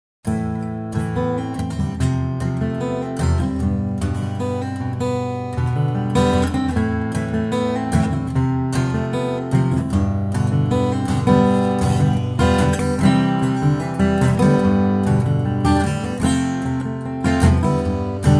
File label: guitar